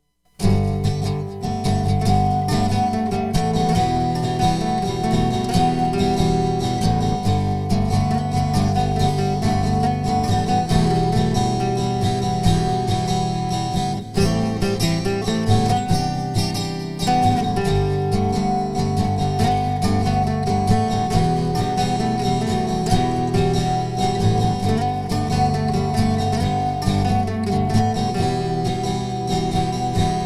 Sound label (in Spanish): acustico